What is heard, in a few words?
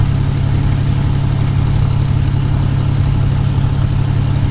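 mustang2 cockpit right, engines; War Thunder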